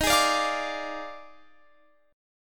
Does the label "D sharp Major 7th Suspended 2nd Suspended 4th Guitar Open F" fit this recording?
Yes